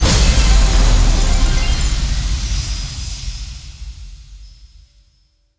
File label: super Mega Win Text Change; strollingstaxx